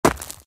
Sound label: foot dirt, footsteps; midnight guns